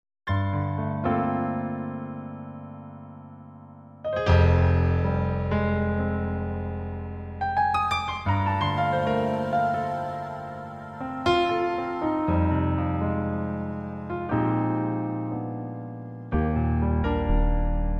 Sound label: Roland FANTOMS